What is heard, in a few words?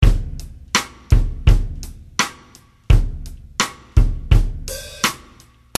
Country